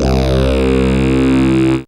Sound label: FILTR BASS